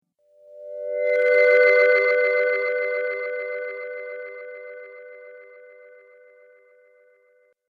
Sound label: Rpg Magical Spell, Magic Sound Effects; Crystal Visions